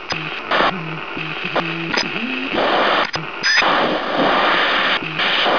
radio static

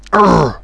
assault pain; portal house

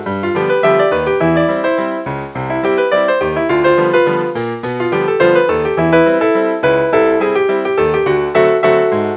piano

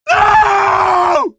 scream

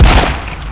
big gun

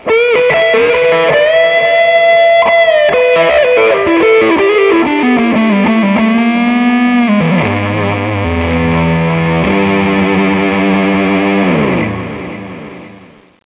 guitar; svencoop

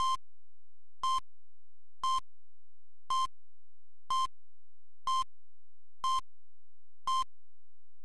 ekg alive; svencoop; saker

